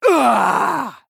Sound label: female human death